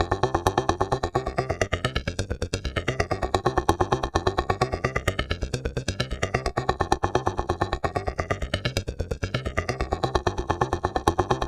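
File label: flanging arpeggio, synth loops; Zero G; Malice In Wonderland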